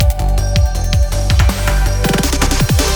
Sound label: Snow Transition; sky rogue